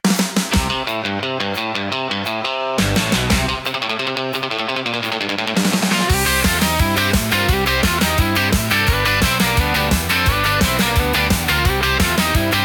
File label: Thunder Strings